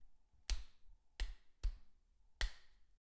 Hard Punching

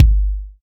Dnb kick

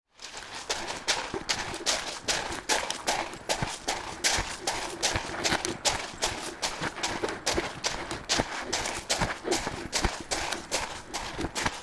Running On Pebble Beach

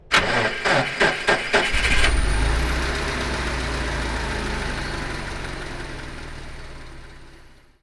Car Startup Engine Ignition

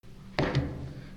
Foley Body Slam Impact

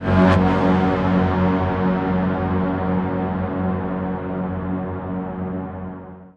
Twisted Orchestra

